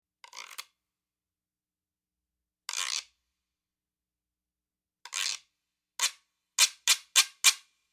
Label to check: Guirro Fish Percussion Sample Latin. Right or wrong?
Right